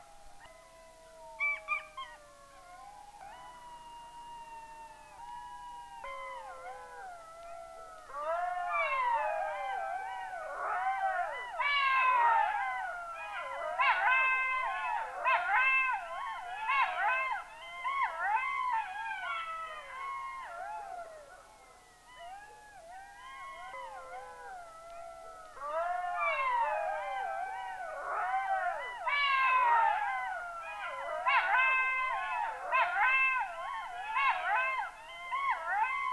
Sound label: Jackals song